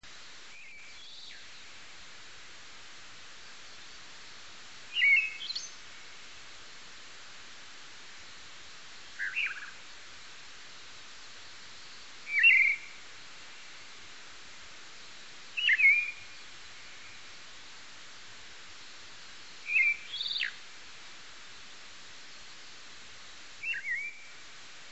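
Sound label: Amami Thrush, amami; anengachi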